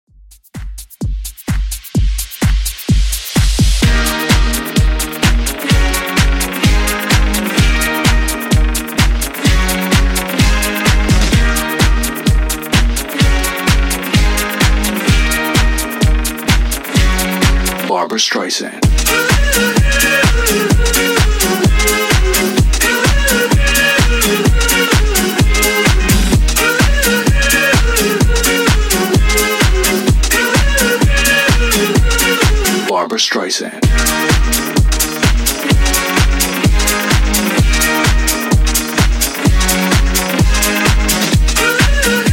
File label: Extended Dance House 128Bpm